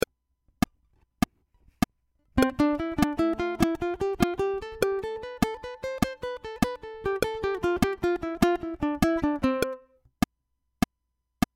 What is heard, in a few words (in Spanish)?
C Escala Tresillo Back Click